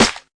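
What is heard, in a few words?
classic hip hop Thick Snare; STYLE KITS (Hip Hop, Etc); Classic Hip Hop Mega Kit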